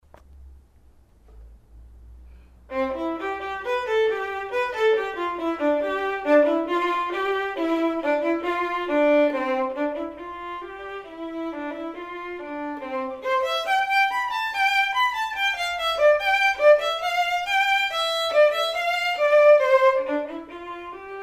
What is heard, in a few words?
perf vln